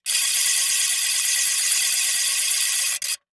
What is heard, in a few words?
Foley Toy Noisemaker Crank Fast